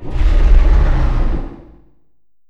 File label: roar